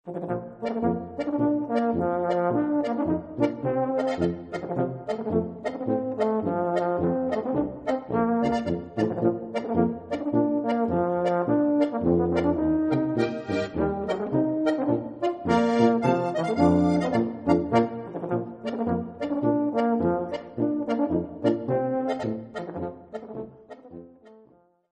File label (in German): Bariton Polka